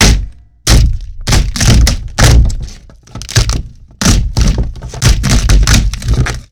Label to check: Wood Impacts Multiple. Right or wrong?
Right